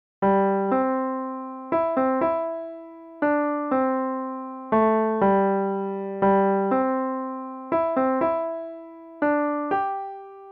beginner piano; amazing grace

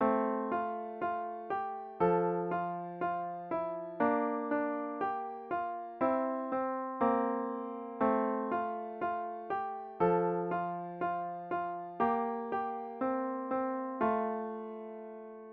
piano

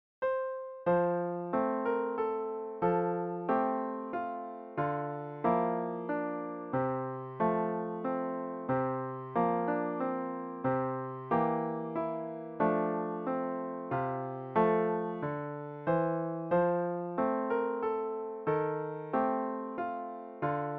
piano solo

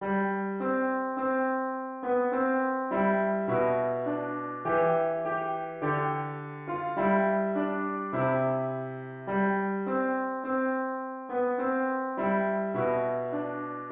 hark the herald angels sing piano

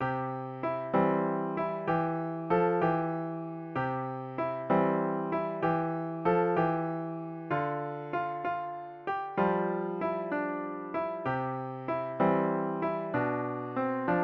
christ was born on christmas day piano

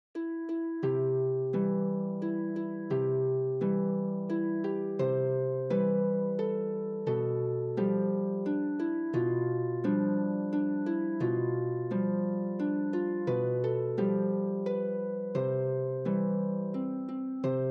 harp

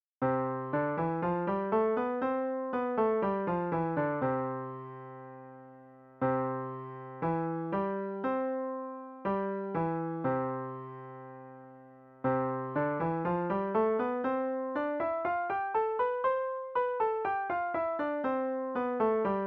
c major piano

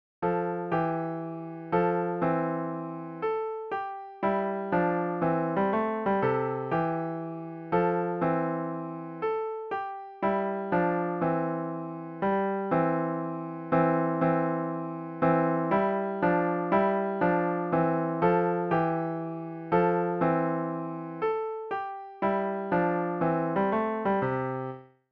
piano

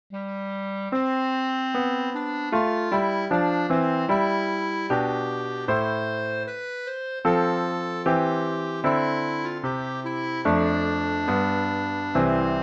clarinet solo; the minstrel boy

